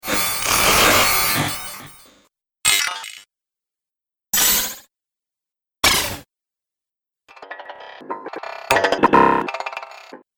Droid Variety, robot; Variety Sound Pack 02; Robot Sound Effects

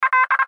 Radio Morse Code; Morse Code Letter L; Morse Code (Sound Effects:Electronics); Blastwave; Warfare

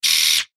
Sci Fi Servo Motor Small Robot; Single Rev

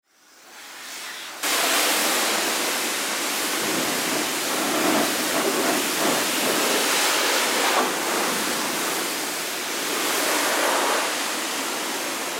Car Wash Self Service Spray Car; Auto Shops; Blastwave

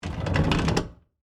Industry, Cart, Roll, By, Wood, Surface

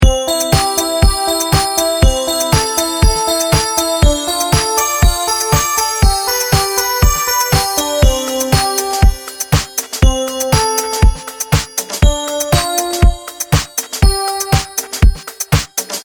Seamless Music Loop Xmas; Xmas Edition; Musical

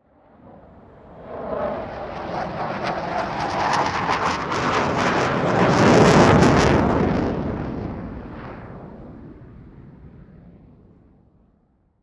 F16 Fly By, Airplane; Vehicles

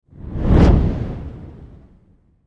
Fireball Burst, Fire Burst Flame Blast; Flames; Sound Design; Flame Sound Effects

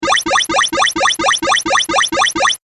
8 Bit Style Health Boost; Video Game

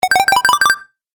Health Booster, video game; Twistedtracks

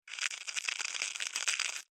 Beetle, Burrow, Dig, Insect, Bug; Beetles; Sound Effects:Animals:Insects; Blastwave

